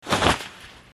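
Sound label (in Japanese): nuno, cloth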